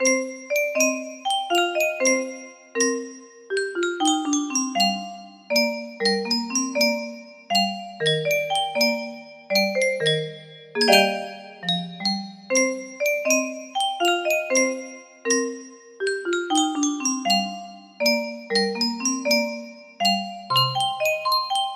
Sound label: celesta